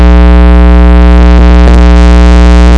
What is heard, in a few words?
bell